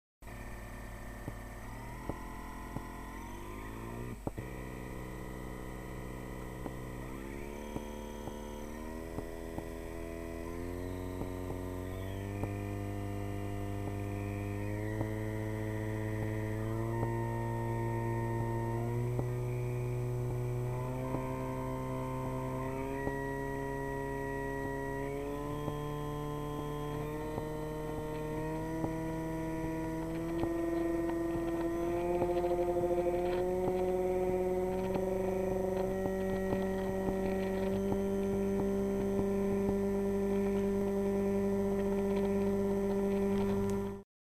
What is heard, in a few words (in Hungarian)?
DM542 hangja 400 lepeses mod 20-400 lepes; Eszterga